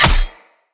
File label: Long Sword Impact Flesh